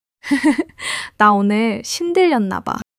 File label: laughter